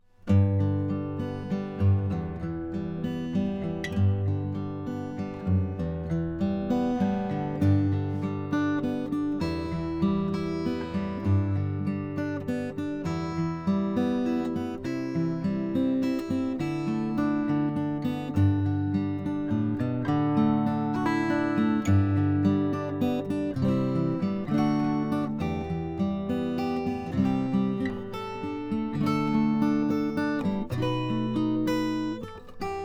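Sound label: collings om1a sunburst, guitars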